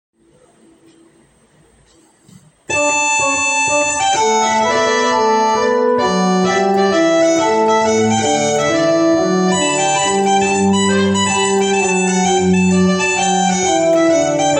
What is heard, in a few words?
Trumpet Tune; Stanley